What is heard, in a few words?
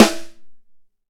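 Wood Snare, EMU PX-7 Drum Machines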